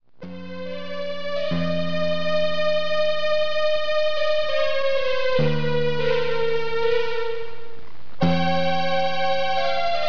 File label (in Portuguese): orgao